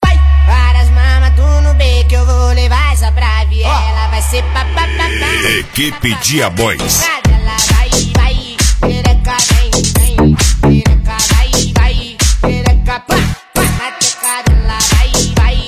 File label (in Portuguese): Carnaval; Carnaval 2019; Aguas de Chapeco